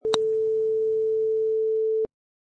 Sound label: Golf Putt